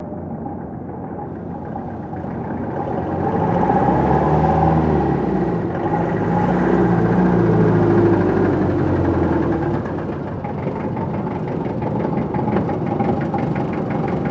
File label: English Taxi Drives